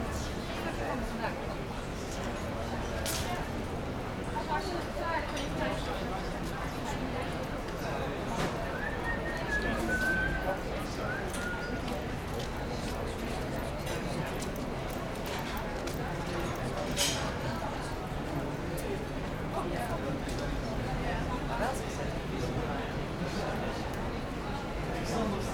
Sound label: Down Town Street Ambience